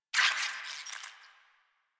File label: Space Drone Attack; Warframe; Corpus Space Drone